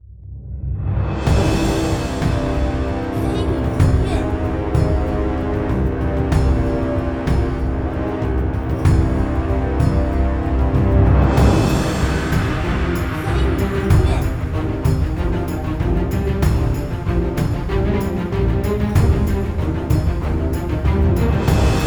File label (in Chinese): 战斗; 三国群英之兵临天下